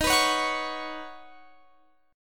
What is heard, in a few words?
Eb Minor 7th Sharp 5th, down, Guitar, Half Step Down; strummed